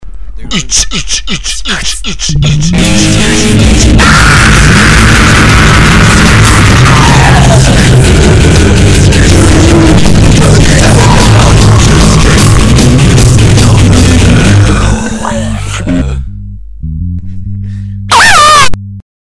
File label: zouk